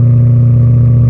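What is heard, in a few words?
engine exhale, advanced engine sounds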